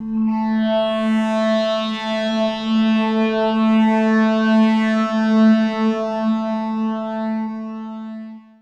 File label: Guitar Textures